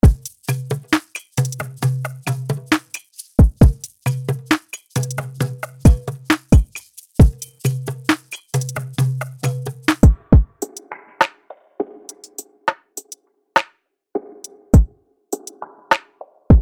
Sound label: Drums; Alchemy Drums; the alchemy collection by audio juice